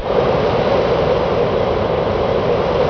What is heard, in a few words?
Rocket, thrusters; 16bit